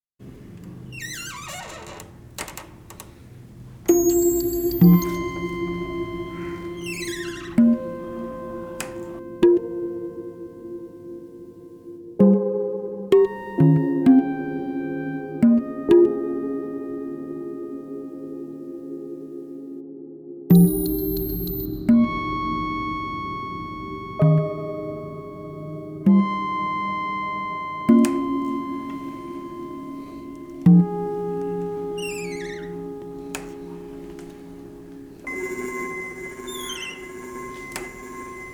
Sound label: Tabla ambient; 24bit96khz; Tablambient